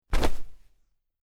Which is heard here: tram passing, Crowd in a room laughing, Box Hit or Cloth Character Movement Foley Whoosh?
Cloth Character Movement Foley Whoosh